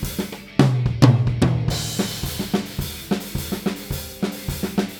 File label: Toms three Combined deBleed OFF